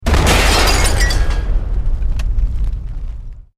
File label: EXPLOSION MEDIUM METAL GLASS